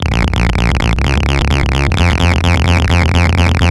Soulphuric Acid Bb, acidized synth loops, 129bpm; Zero-G; Soundclash; Nu Dance Electro

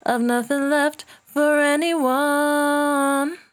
68bpm D, Velvet Vocal; Zero-G

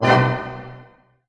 F# MIN, Orchestra, ORCH HITS; Sound On Sound; Peter Siedleczek's Orchestra

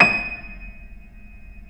Keyboards Acoustic, D#6; USB Soundscan vol.17; AKAI

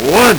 LSDj, Nintendo Gameboy; 300 Drum Machines

